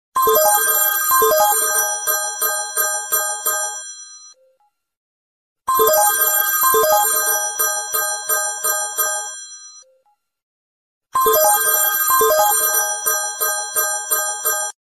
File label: ringer Nokia ringtone, Nokia sms ringtones